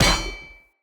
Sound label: Shield Impact Default; Weapon Impacts; Enemy; Shields; Sinecure Shield